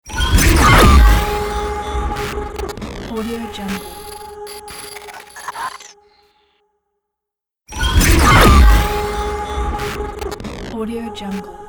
hitech glitch logo

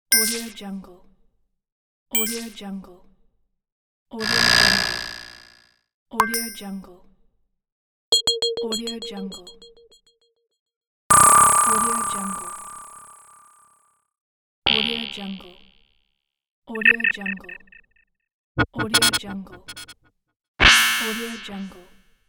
modern ui glitch elements

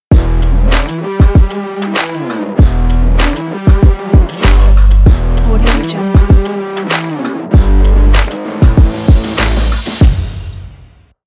hip hop rock logo

